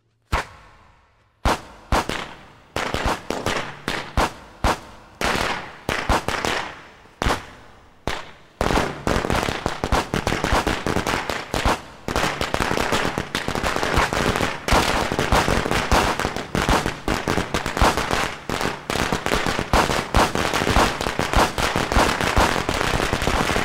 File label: Fire Crackers Sound Effect